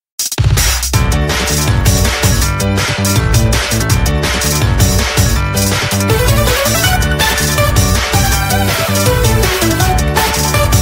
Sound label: Single Player Battle; Taisen Bomberman; android